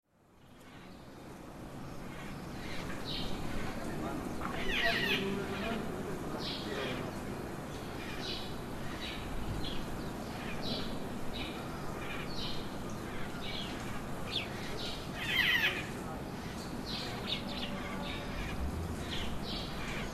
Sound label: bird; eldoret